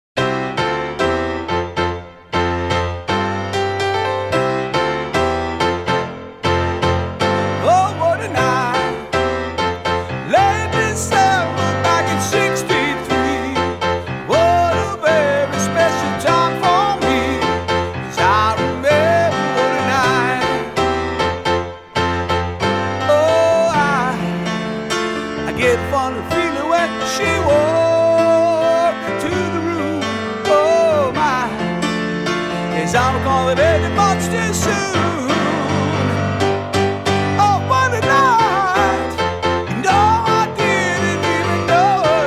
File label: Solo Piano